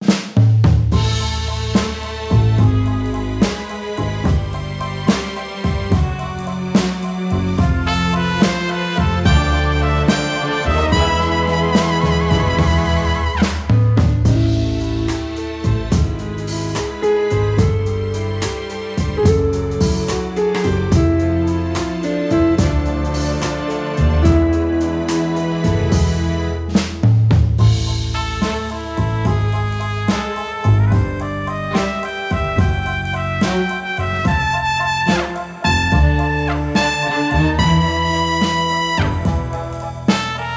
Gloomy Trailer; chicken police; Easy Chicks

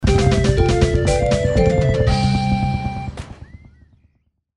Level Complete Theme

